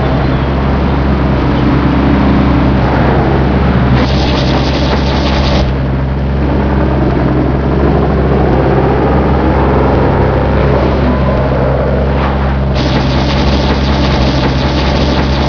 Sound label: truck; race into space